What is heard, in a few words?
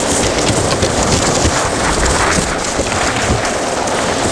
grind float; terrains; Tony Hawk's Project 8; XBOX